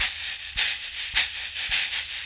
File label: Berimbau Shaker; World Percussion